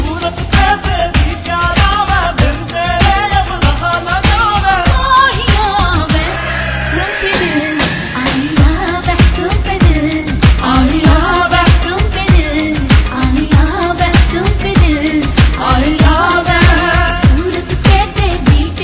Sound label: Ultimate Tone